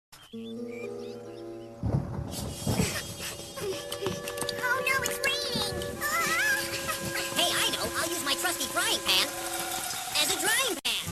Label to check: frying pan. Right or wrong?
Right